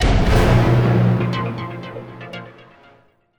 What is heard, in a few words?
Jingle Fall; Champion Jockey: G1 Jockey & Gallop Racer; Xbox 360